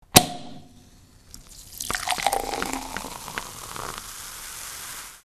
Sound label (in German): sektflasche prosecco oeffnen; salamisound